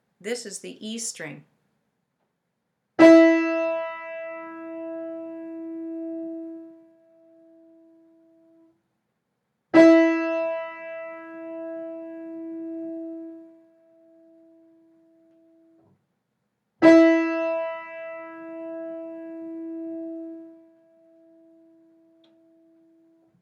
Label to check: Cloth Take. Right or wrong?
Wrong